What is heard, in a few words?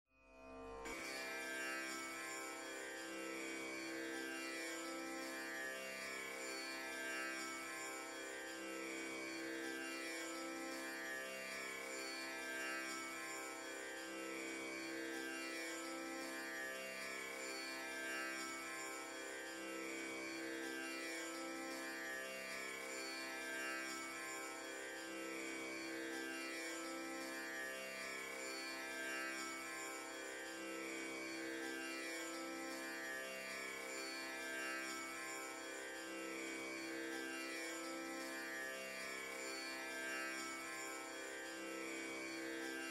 Hindu Devotional Karaoke